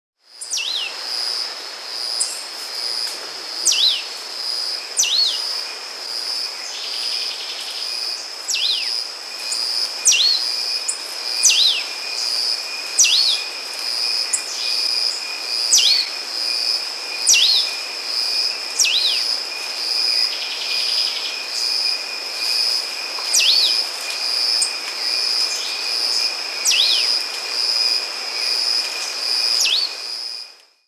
White-winged Shrike-Tanager (Lanio versicolor), Peru; S. America